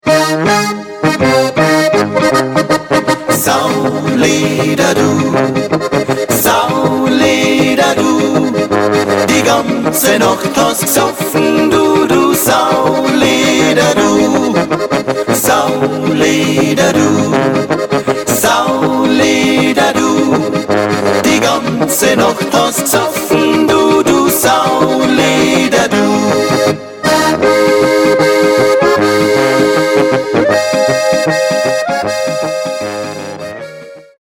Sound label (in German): Sauleder Polka